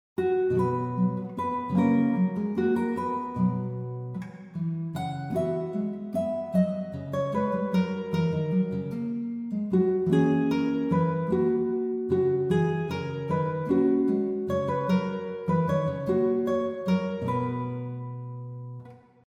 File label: Bard Draft